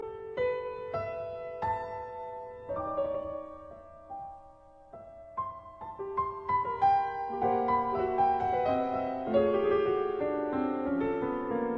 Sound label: Prelude in E major; 6 Preludes and Fugues